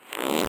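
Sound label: attack windup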